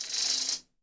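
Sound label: shake, left, Percussion; Miroslav Vitous - Percussion & Harp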